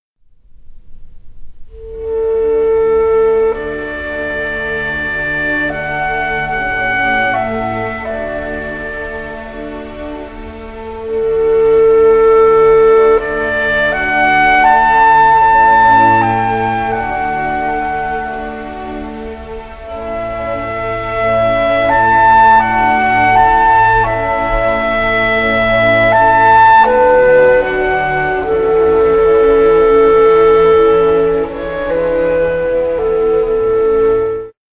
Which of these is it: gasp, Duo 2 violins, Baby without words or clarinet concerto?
clarinet concerto